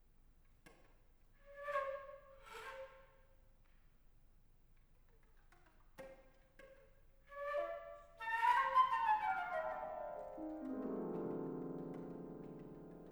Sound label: Flute and Piano